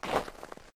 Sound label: snow, footsteps; civ13; civ14; Civ Effects